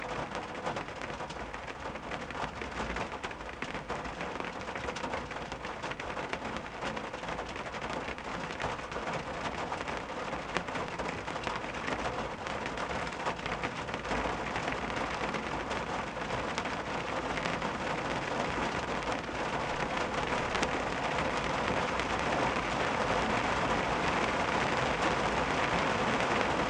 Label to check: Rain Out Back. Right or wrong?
Right